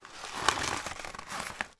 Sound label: cardboard sheet scruch up; foley